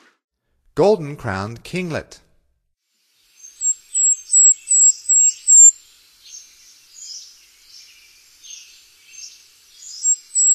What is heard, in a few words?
Golden-crowned Kinglet, Songs of Birds of North America; Stokes